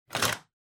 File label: Pen Holder Take Out